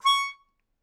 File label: Melodica Short; Short Notes Chromatic